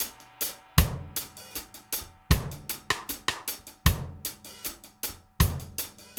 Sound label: DOWN KIT 78; AMG Gota Yashiki Groove Activator